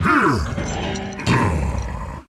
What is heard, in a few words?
Recoil; Puzzle Chronicles; PS3; PSP; X360; SFX 3D Gothe